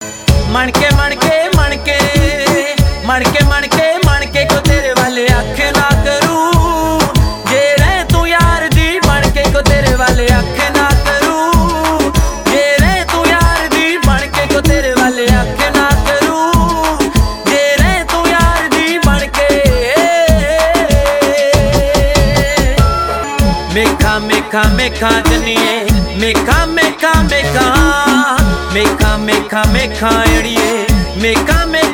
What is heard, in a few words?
Punjabi Bhangra Tones